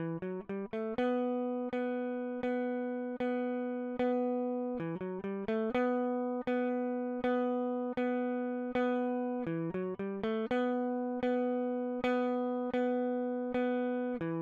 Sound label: electric